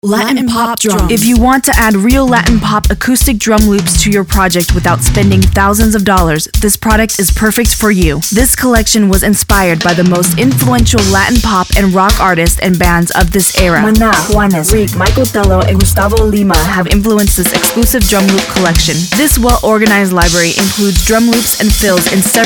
Latin Pop Drums Loops; RemixLoops

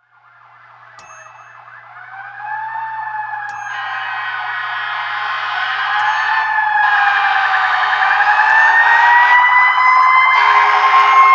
Fire Truck in the Rain

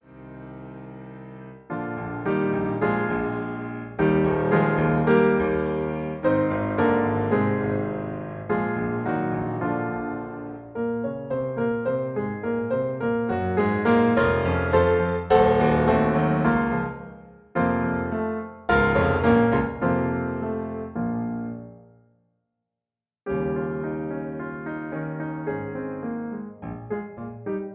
Accompaniment E flat

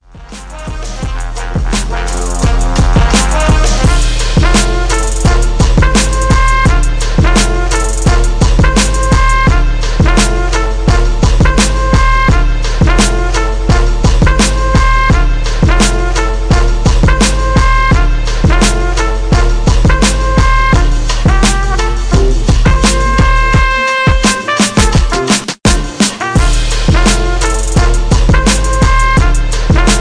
INSTRUMENTAL

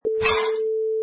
Dish Clink

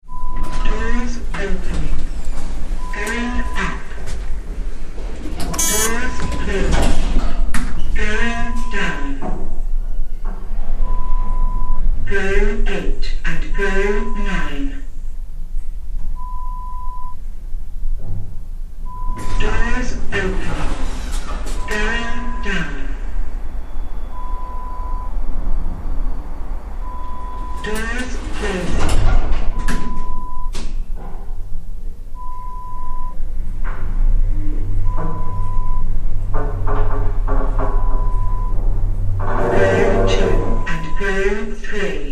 Elevator Sound; Lo-Fi

